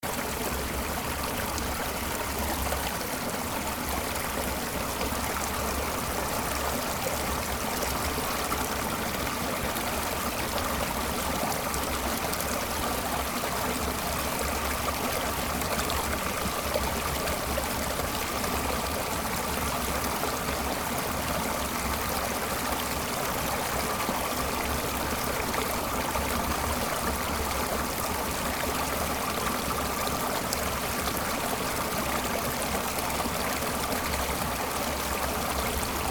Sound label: water creek cave sound